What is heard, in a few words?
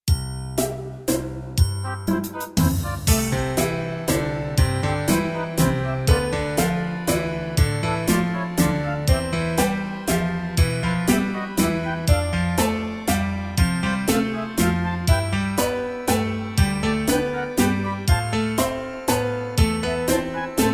waltz